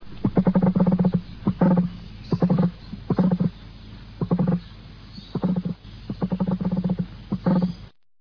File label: emu, animals